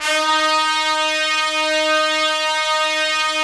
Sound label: Trumpets; Roland - LA Composer vol. 4